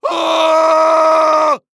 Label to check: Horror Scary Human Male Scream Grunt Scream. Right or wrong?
Right